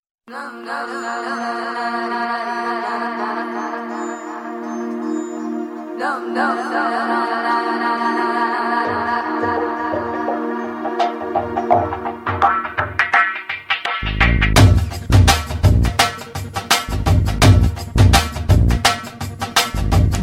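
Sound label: background music Telugu Ringtone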